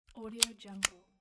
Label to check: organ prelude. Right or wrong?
Wrong